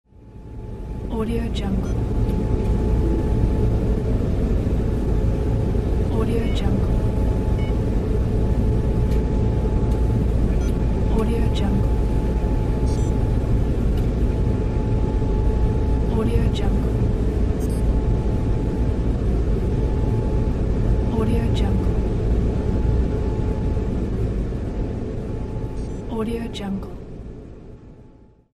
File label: server room ambience